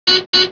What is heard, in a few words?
wrong entrance; ticketcreator; BarcodeChecker